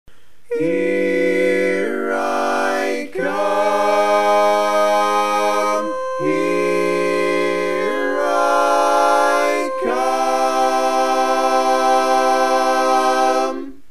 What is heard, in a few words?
Bass, barbershop tags